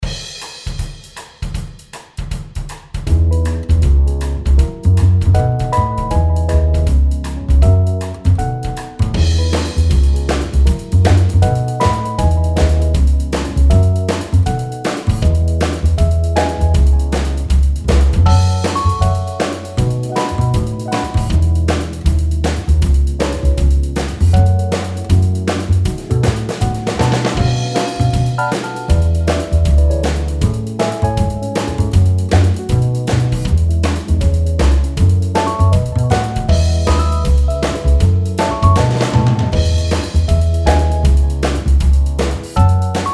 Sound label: backing 158bpm